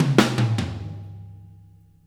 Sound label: Fills 75; Zero-G; Monster Beats